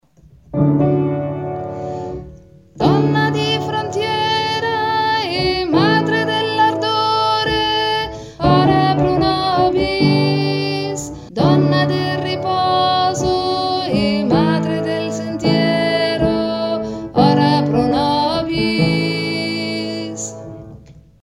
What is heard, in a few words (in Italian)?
ave maria verbum panis strofa tenori